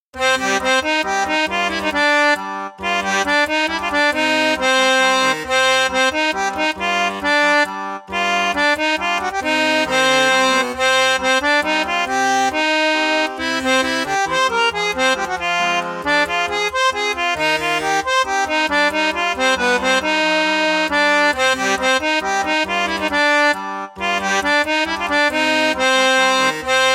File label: accordion sorrow